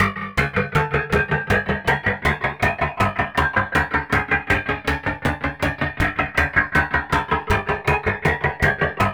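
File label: flanging arpeggio; Zero G; Malice In Wonderland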